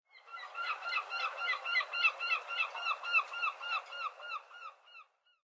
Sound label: Ambience Menu Seagull; subway surfers city sound effects; Android, iOS